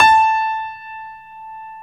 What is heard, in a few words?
Stereo Grand, Keyboards